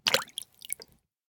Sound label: shell 12ga water; STALKER; IWP